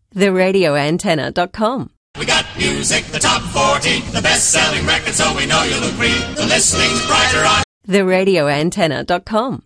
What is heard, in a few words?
Aircheck Jingle Top 40 brighter